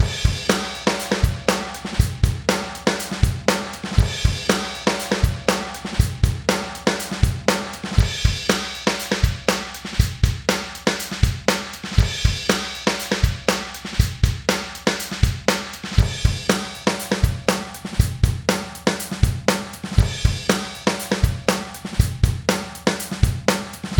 Drum Distortion

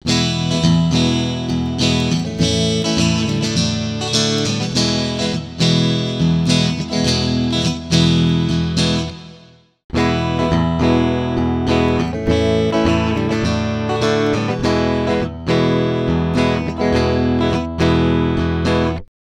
Acoustic Guitar Simulator

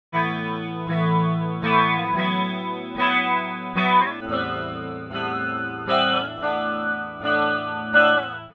Static Guitar

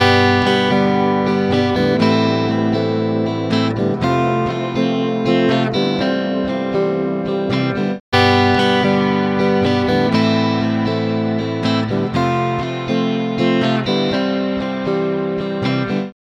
Guitar Reverb

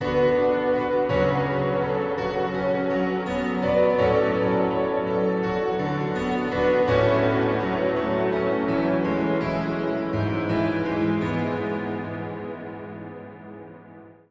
Normal Reverb

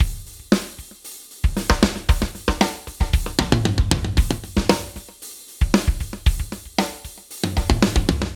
Gated 80s Drums Delay

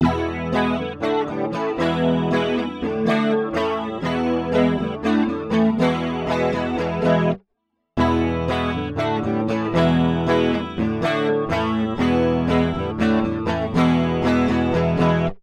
Drenched Chorus

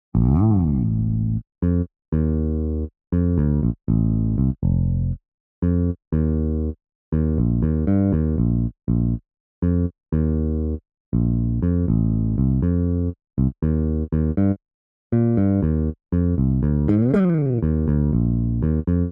Bass Solo no Dist; RedlightDist

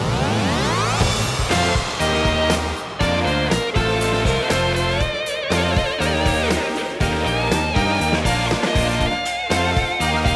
Bass Mix with Redlight Dist

